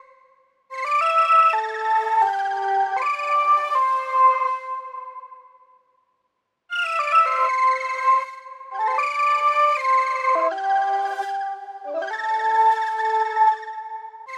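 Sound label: 80 lead C major; icing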